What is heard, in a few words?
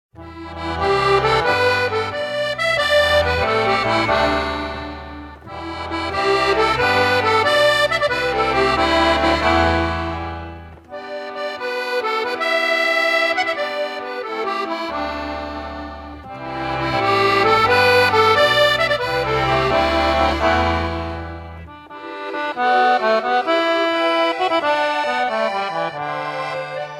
irish, accordions